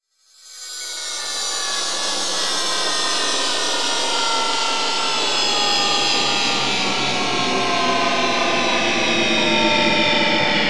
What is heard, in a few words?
Drone Dark Ghostly Descend; Blastwave